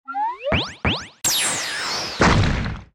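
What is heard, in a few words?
attack; hero sound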